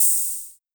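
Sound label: Hi-Hat Open, Luxor Passet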